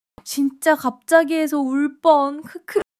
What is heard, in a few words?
laughter